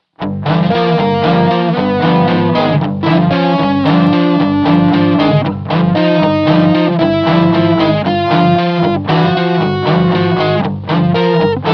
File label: string quintet